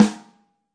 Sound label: real snare; REAL LIVE KITS; A Real Freakin Drum Kit